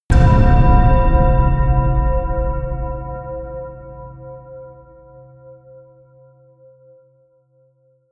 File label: bell punch